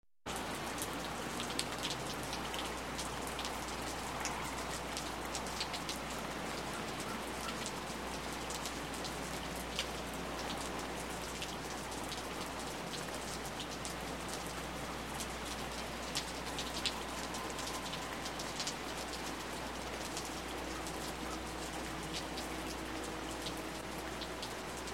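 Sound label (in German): kurzgeschichte hintergrundgeraeusche regen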